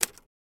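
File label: Typewriter Single Key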